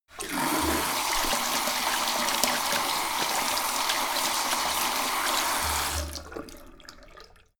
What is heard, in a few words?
sink fast flow wash turn off on